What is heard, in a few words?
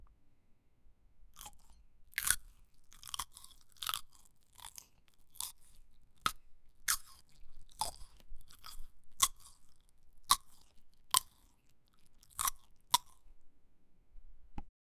juicy sounds as the fruit, chewing